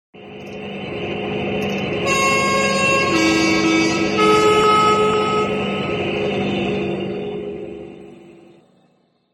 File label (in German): Postauto